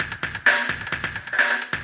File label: Dubstep 70bpm, MIDI Drum; Ableton; Factory Packs